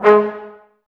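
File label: brass trombones G#, Woodwind Brass Ensemble; 90 s Sample CDs; Miroslav Vitous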